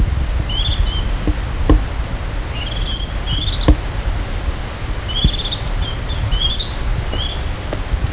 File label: jweye, birdcall